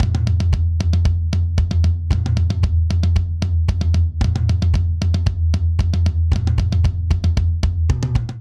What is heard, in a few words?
Polyrhythmic Dance Buildup 114 bpm; MIDI Clips; Ableton; Factory Packs; Drum Booth